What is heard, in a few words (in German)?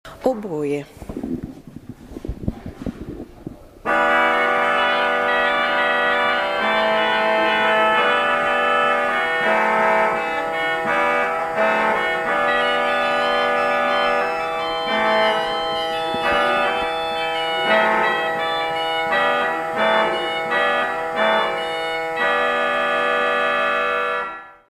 Oboe, Pfeifen; Toene